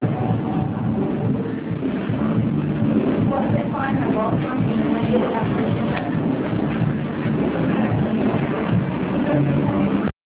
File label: Warning